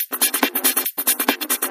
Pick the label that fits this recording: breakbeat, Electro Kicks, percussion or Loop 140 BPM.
Loop 140 BPM